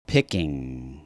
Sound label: picking